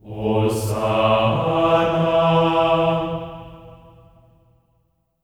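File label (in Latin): hosanna A3; Spectrasonics; Symphony of Voices; GR HOSANA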